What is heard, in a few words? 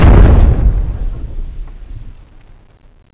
step, alien; npc; cstrike